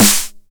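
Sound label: 909 SD Tape, snare drum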